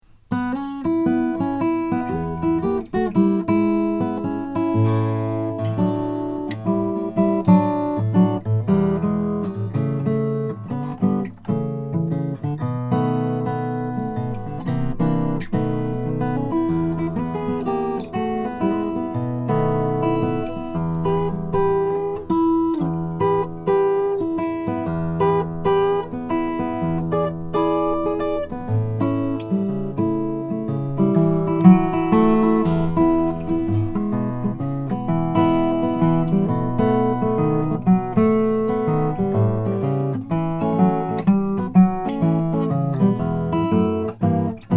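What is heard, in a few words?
froggy bottom c deluxe all sinker mahogany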